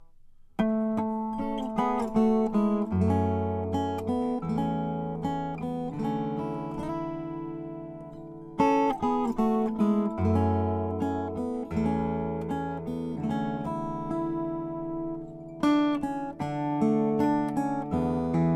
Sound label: rainsong; blue tint